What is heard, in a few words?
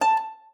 STOP G#5, harp; Xsample VOL-8; HARP+FX